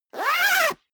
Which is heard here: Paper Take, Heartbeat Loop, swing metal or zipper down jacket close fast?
zipper down jacket close fast